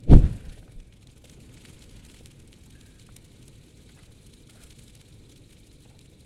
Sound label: fireball Shoot; Wizzard; HandyMann; VRTemplate; fireball sound